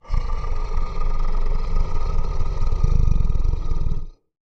Monster growls and snarls. Creature, Monster Growls, Monster Eerie Sound